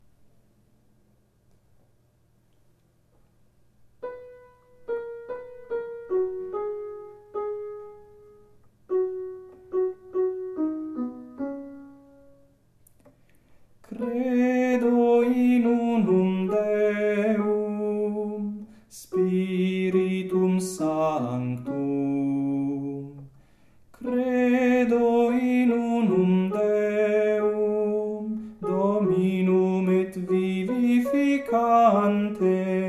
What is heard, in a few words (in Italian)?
Credo bassi